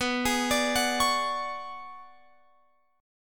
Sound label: B Minor 6th Add 9th B, Guitar Open F; Bm6add9 arpeggiated